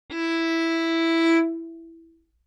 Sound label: Violin arco ff sul D E4; MIS Pitches - 2014; Strings